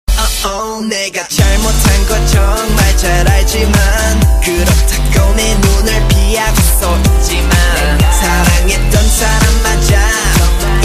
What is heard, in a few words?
Solo Rap